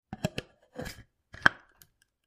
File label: Coffee Grinder 2; Coffee Beans Being Poured Into Coffee Grinder. Close Perspective. Cafe, Restaurant.